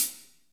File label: HAT, Drumscapes Roland, Fast Rock; Northstar